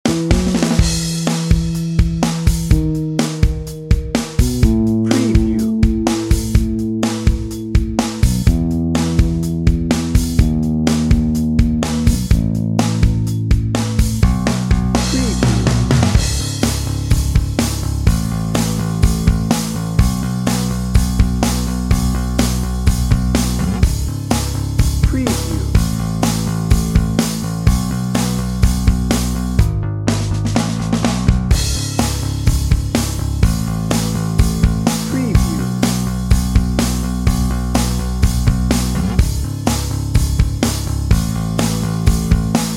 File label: reflective and hopeful dnb